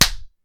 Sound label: Slap